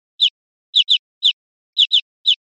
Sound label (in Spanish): piada suelta, verderon